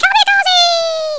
kamikaze; worms dc; tykes

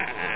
squeek

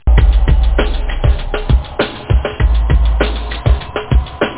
drummer; happyday; animotiv

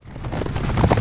horse

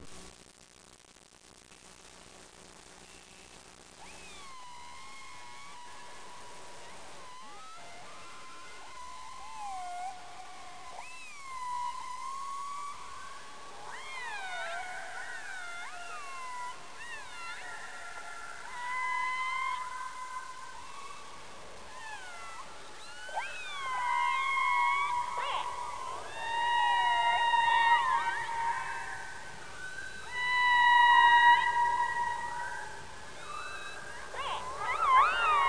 whales

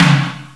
tom; Descent

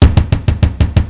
Bass Drum; Soundsation; Music

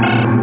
shooter

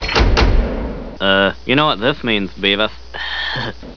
rune key; butthead

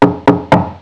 TOK; Piposh

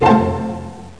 ORCHEST

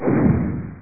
MISSLE

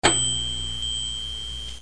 typewriter bell

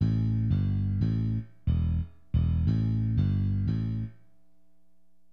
BASS, HipHop; LiveAct Pool

